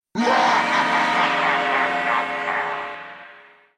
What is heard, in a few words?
Horn Halloween Laugh; planetside2